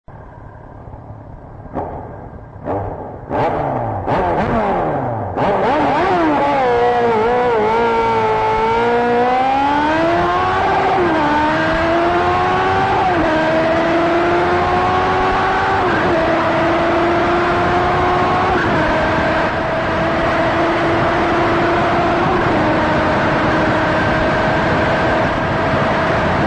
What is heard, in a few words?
Kawasaki 1100ZZR; Sons motos